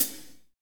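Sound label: hi-hat, Kit Wet; Northstar - Drumscapes Roland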